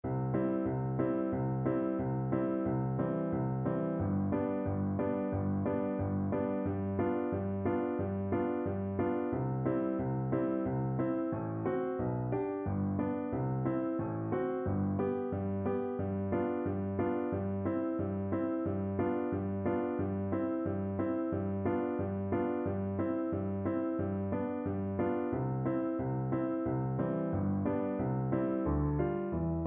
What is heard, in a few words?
Low Voice accompaniment; Lesson X Introduction to the Turn; MTB Singing Vaccai